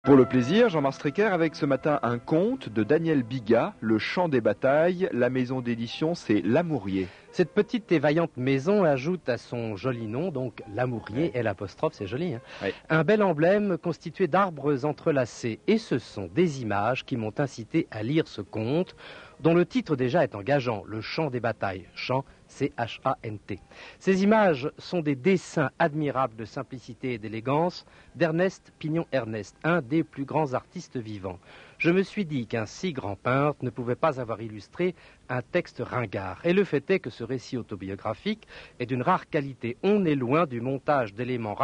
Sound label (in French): chant batailles